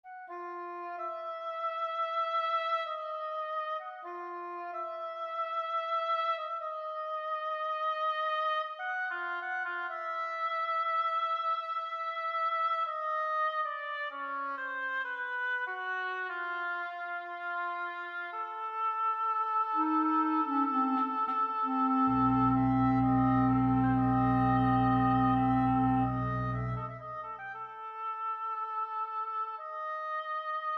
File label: Philharmonik; Octandre; Miroslav Philharmonik; N3 Reverb Off